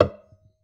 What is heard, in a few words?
Classical Guitar Extended Technique